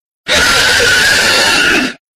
mannequin scare; kraven manor

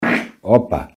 fart

